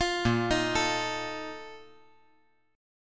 Bb Major 7th Suspended 4th, chord

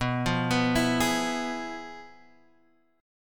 F Minor Major 7th Flat 5th, B

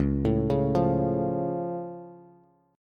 Db Augmented, down, Bass; arpeggiated; JGuitar

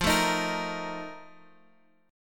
F 11th F, down, Guitar Half Step Down

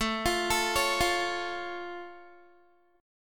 A Augmented A down Guitar; A+ arpeggiated

